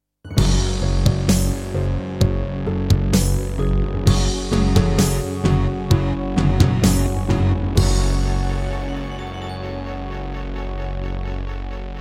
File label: Terratec MiniWaveSystem WT64; Doom (Sigil) - Introduction